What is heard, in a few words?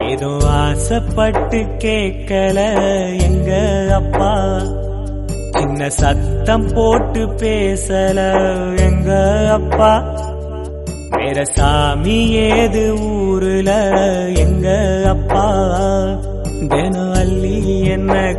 Tamil